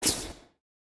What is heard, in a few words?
whiz sub; impact; midnight guns